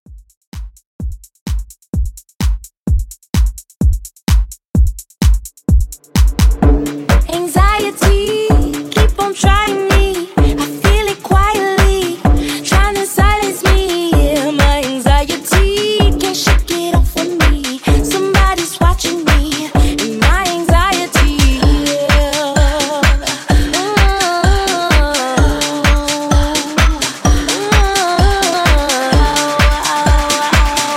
128 Bpm

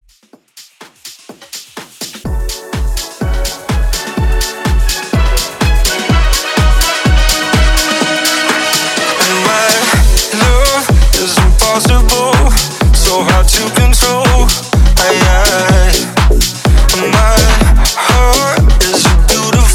Top40s House Dance, 125 BPM

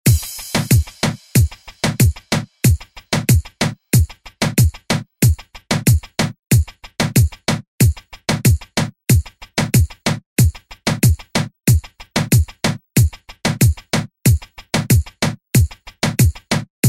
Re Drum

